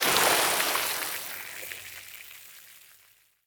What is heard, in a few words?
liquid